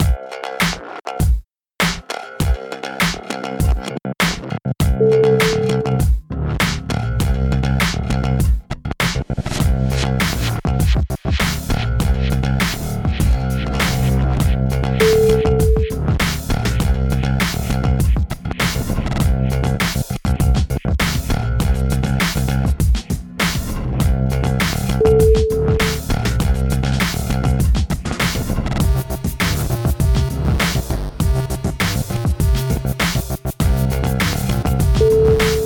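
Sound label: glitched out, music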